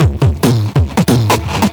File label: Beatbox Loops, Human Beatbox